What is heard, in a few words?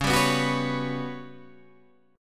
G Major 7th Flat 5th C sharp